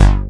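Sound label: sequence bass; Pro-Rec - Synthsonic; BASSES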